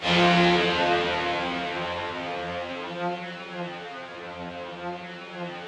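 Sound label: Yamaha VP-1, rough strings F1; 90 s Sample CDs; Music Store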